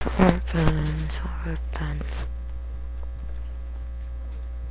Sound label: sharpen